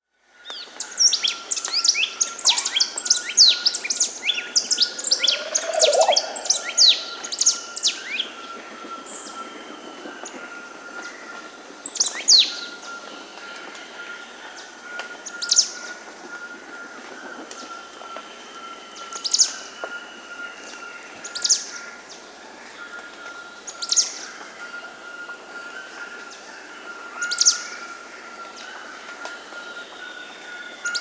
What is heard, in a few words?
White-vented Euphonia (Euphonia minuta), Peru; S. America